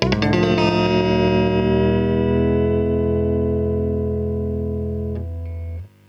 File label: HAWAI, COUNTRY; USB Soundscan vol.31; Miro s Grooves; AKAI